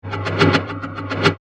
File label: Fan Blade By, Science Fiction; Blast Wave